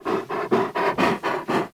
Kubrow Tame Sit Idle Pant C; Warframe

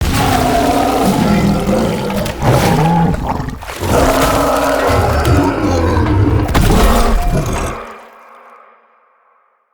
Wake To Eruption Burst; Deora; Garren Eruption

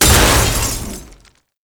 Loot Crate Open; Objects; Storage Container; Narmer; Warframe

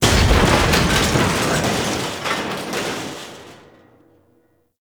Military Structure Collapse; Warframe; Ambience; Nineteen Ninety Nine

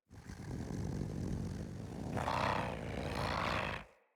Vocal Idle; Warframes; Khora

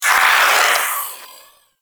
Vocal Attack; Warframe; Duviri; Shadow

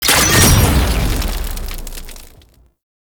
Ammo Select Heat; Warframe; Frumentarius; Ammo Ability

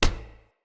Unarmed Punch Impact Metal; Weapon Impacts; Player; Environment

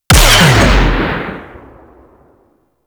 Shape Blast Shotgun Shot, Weapons; Warframe; Corpus

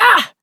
Pain, Adult Operator; New War

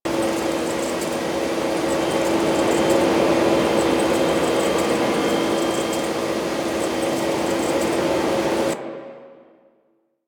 Mods Station Loop; Interior; Ambience; Warframe; Nineteen Ninety Nine; Tailor Shop

